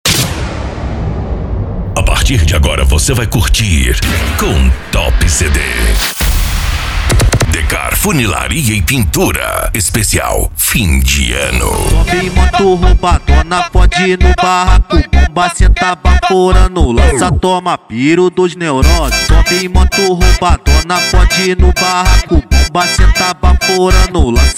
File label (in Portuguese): funk ritmado